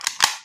strzal

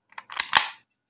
strzal

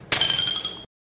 dzwonki; familiada

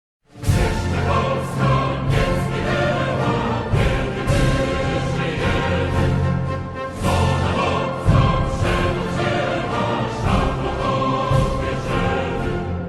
mazurek dabrowskiego

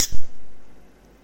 puszka

